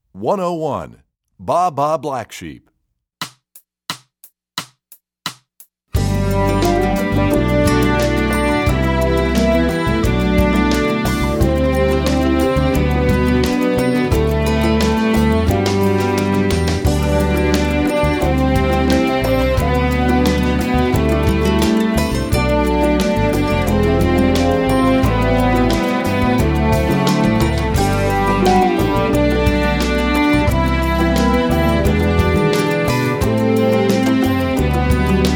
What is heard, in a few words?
Baa Black Sheep, strings; EE2000 Book1